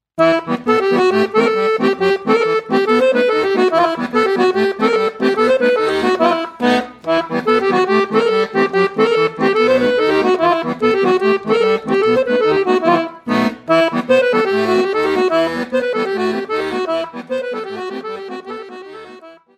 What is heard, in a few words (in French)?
accordeon; arcanul; chisinau 2013